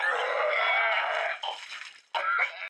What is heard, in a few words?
vomiting sound effect really big vomit